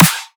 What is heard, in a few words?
drum snare hyper; Virtual Riot; Drum Hits